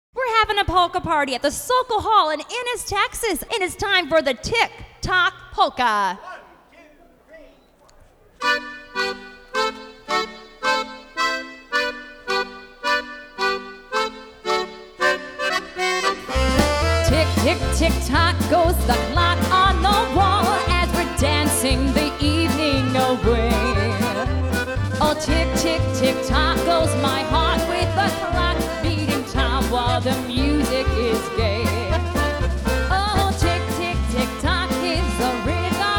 Tick Tock Polka

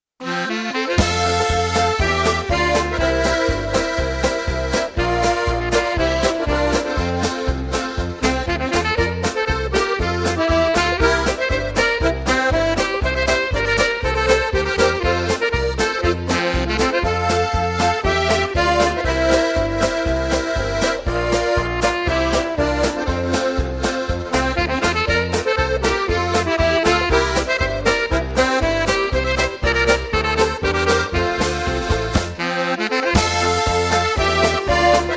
Polka

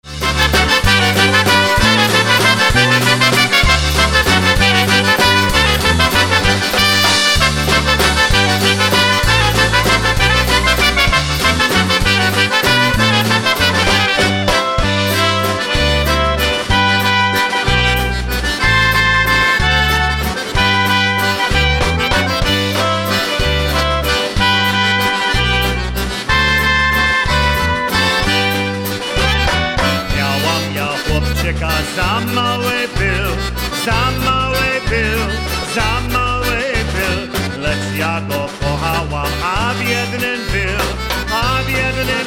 Oberek

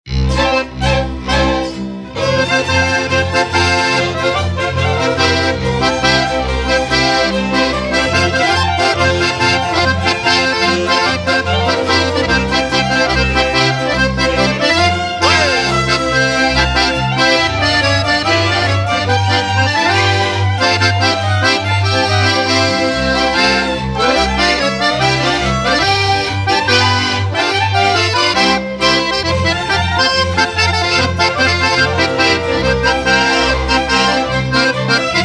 Polka

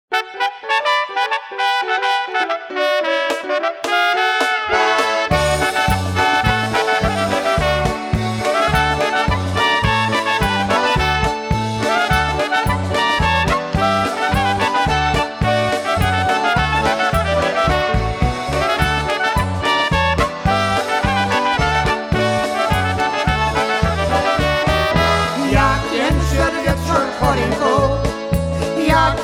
Polka

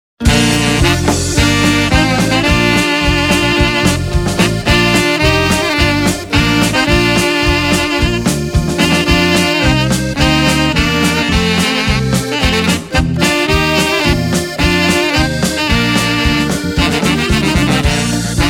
Polka

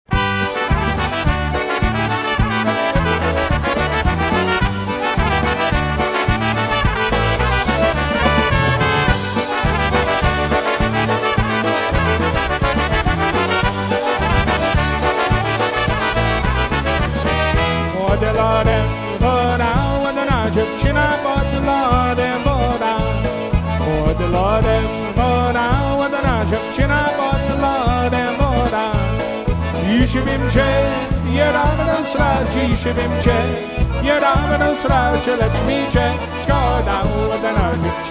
Polka Medley, Live Jam

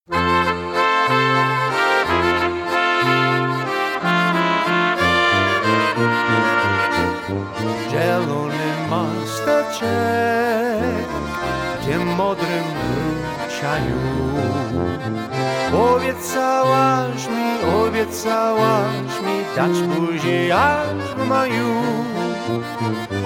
Oberek

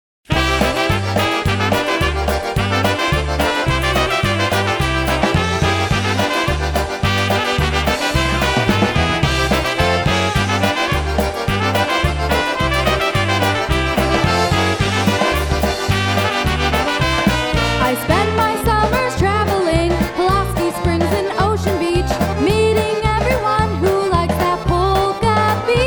Polka

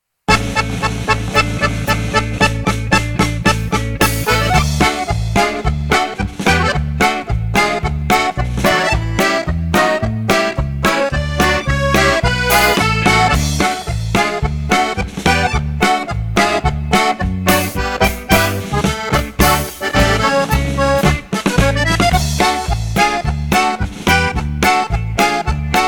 Rain Rain Polka

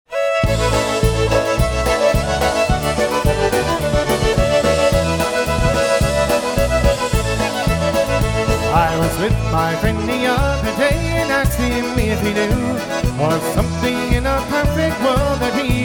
Drink Beer Play Polkas Polka Live Jam

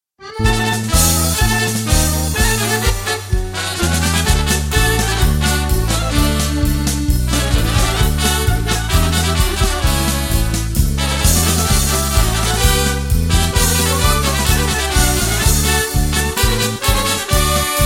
Polka

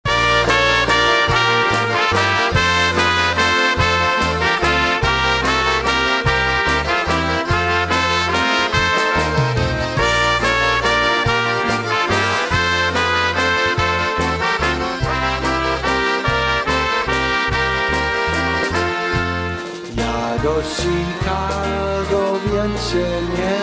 Waltz

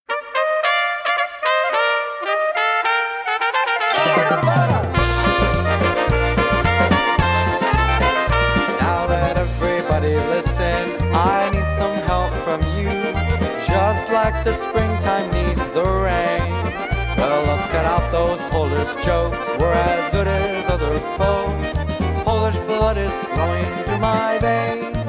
Polka Medley

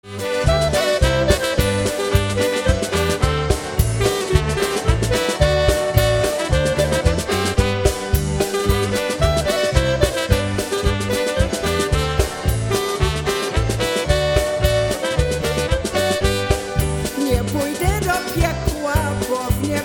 Polka

